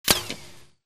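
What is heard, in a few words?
bullet light ballistic titan ricochet; Titanfall 2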